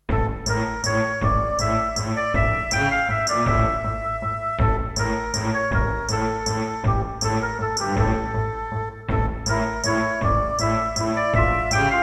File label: Creative Sound Blaster AWE64 Gold